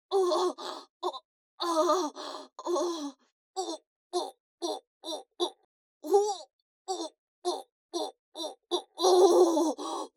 喘ぎ声

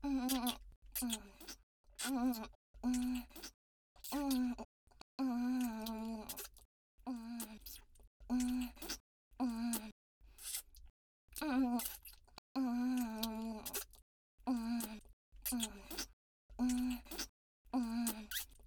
フェラ音

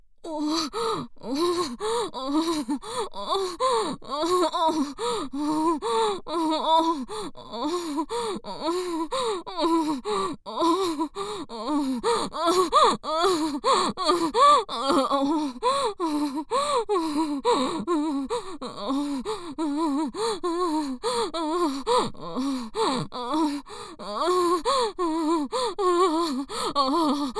喘ぎ声(オホ声)

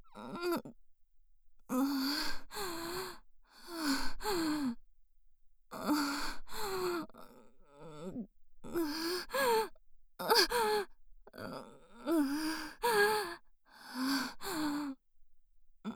喘ぎ声 我慢

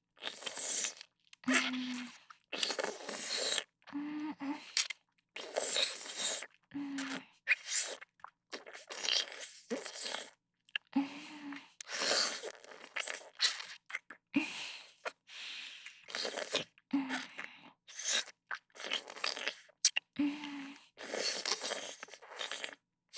フェラ音(激しめ)

